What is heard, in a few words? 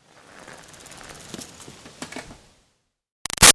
Bicycle Up Stop Short; Bicycles; Vehicles